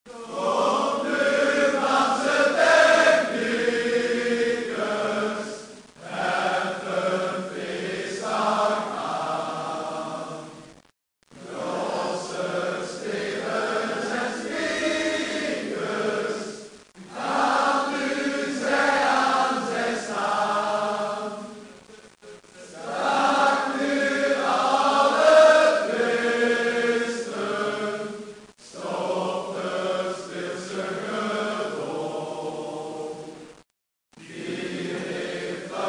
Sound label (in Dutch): clublied